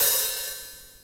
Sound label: drum hit whistle; izduh v4 blue simple